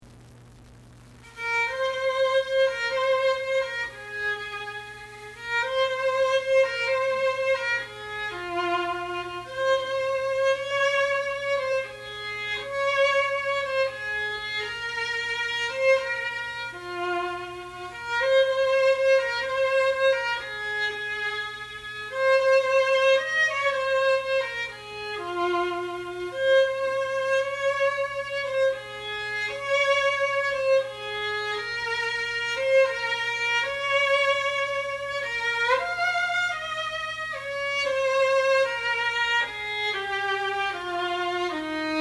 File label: solo violin